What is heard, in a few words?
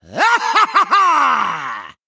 Human Male Attack